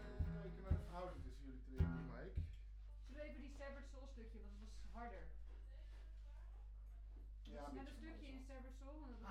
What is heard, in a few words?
Voc Omni